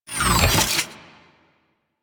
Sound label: Plasmor Un Equip, Shotgun; Arca Plasmor; Warframe; Weapons; Corpus